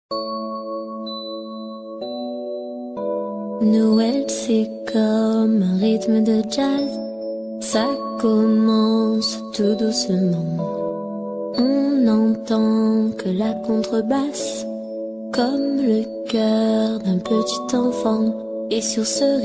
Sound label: Noël Jazz